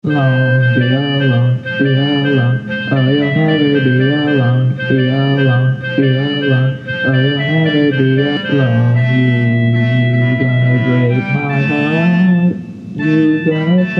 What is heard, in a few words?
remix vocals and instrumentals